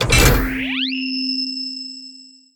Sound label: char radar